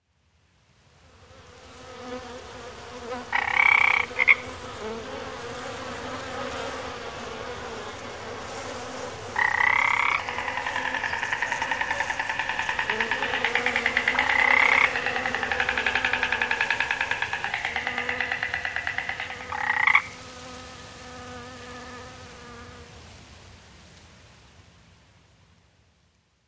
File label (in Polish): Odgłosy łąki